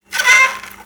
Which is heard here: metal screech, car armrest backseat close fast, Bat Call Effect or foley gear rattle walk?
metal screech